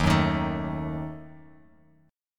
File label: D sharp Diminished D sharp Bass Standard; strummed; D#dim; 11,9,13,14; JGuitar